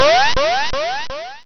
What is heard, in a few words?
Sound Game Over; arcade-canvas; ninja-runner